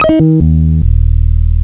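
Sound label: jazz guitar; Amiga Format CD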